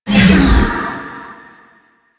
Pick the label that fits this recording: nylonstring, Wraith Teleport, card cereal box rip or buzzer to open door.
Wraith Teleport